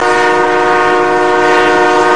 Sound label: train sms